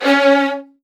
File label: C#1, Virtuoso Strings; ILIO; Kirk Hunter Virtuoso Strings VOL-1